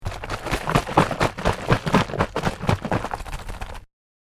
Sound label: Shaking Bottle Of Foamy Liquid; Shaking Sound Effects